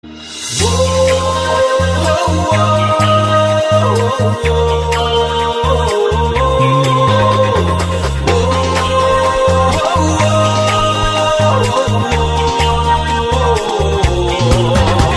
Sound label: Msg Tone